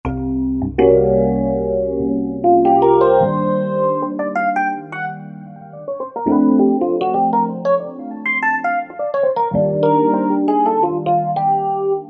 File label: Roland V-Synth; Road2the70s; Factory Patches